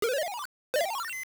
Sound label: Dungeon One Way Door; Walking through a dungeon's one-way door